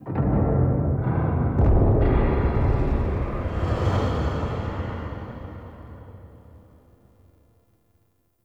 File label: Stone Puzzle Activate Far; Puzzles